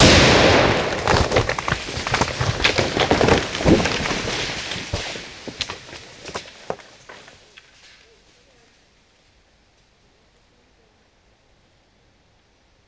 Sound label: Heavy Explosion In Forest Debris Tree Falling